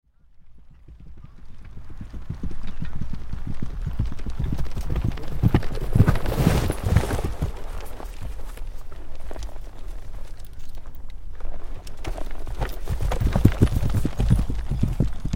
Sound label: 5 Horses Canter Up Stop and A; Historical; Hollywood Edge Historical Series; War Horses Armies & Weapons